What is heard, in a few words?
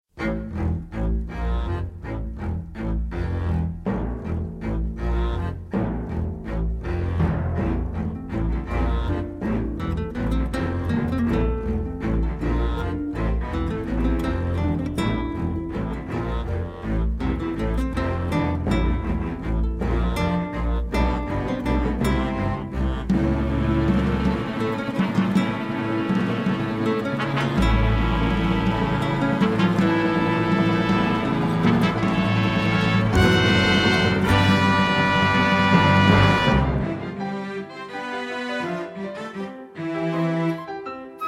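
the hammer with melody backing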